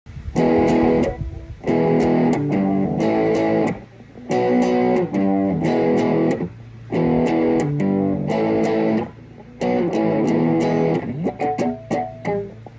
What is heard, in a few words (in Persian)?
Rock and roll, موسیقی راک اند رول بی کلام